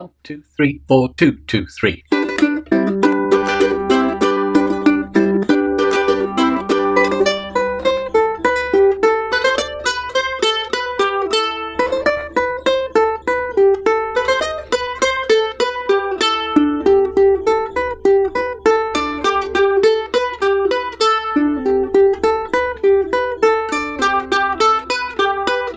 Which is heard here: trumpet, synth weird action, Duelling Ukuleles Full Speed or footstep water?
Duelling Ukuleles Full Speed